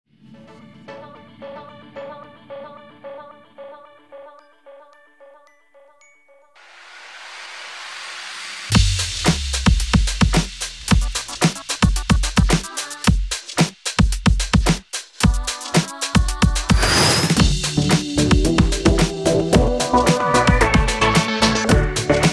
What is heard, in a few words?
Intro Song, Instrumental; Equestria Girls